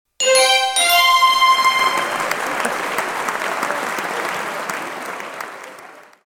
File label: Quiz correct sound with applause; The Filmy Food Quiz